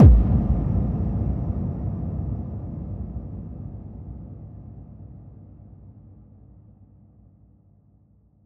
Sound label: Impact FX